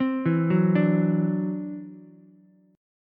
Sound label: E 7th Suspended 2nd Sharp 5th, E, down, Ukulele; E7sus2#5; arpeggiated